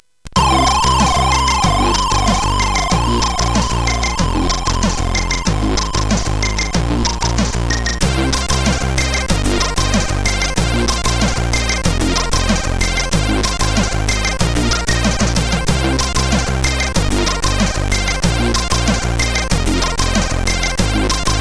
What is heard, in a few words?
Test, CSG8580R5; hvsc